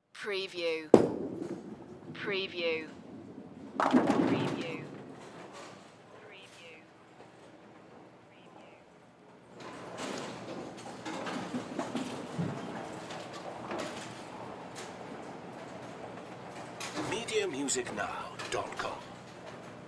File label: Sports Bowling Alley Ambience Less Active